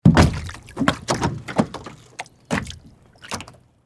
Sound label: Boat Step Kayak, Step, Into; Transportation, Watercraft; BlastWave